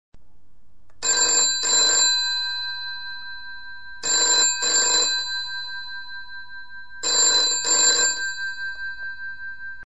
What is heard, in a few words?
English bell phone